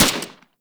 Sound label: Fire A; Tenno; Dual Soma